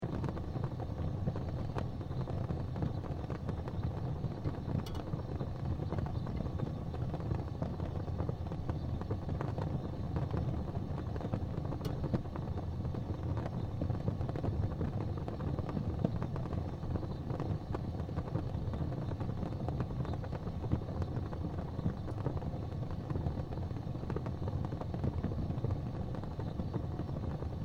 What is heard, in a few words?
Fire Gas Fireplace Loop; Flames; Objects